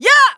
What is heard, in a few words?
kiai; MonsterHunter; Fight